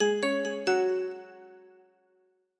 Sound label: Bells Notification; MobiWire HomePhone 4G; notifications